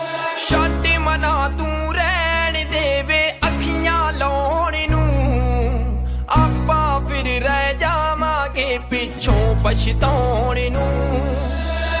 Sad Punjabi Song Ringtone

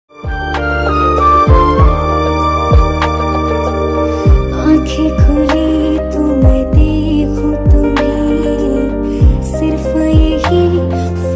Female Version Hindi Song Ringtone

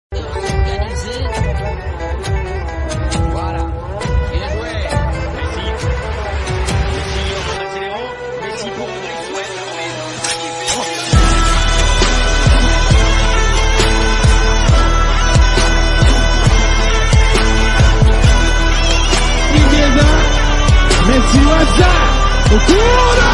English

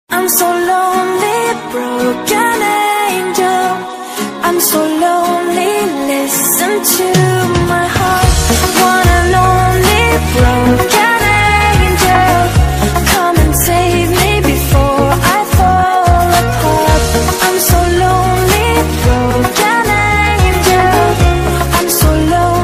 English Song Ringtone

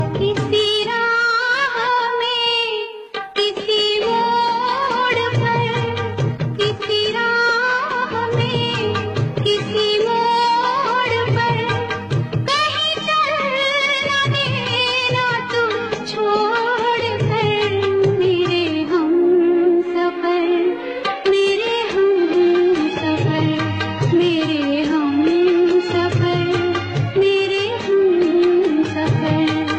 Old Hindi Song Ringtone

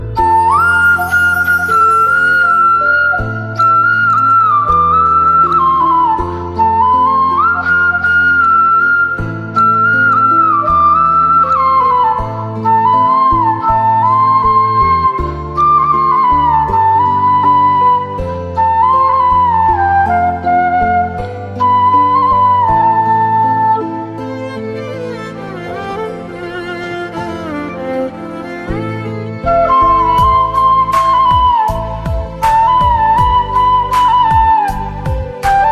Instrumental Ringtone

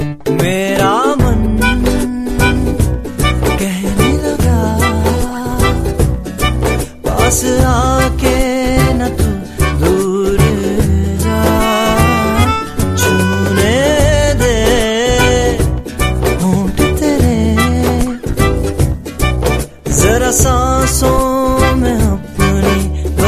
Hindi Song Ringtone

